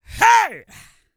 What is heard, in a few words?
GRUNT, MALE HUMAN; Spectrasonics Vocal Planet